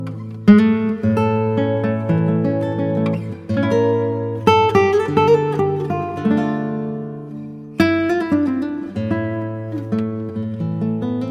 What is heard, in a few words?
Guitar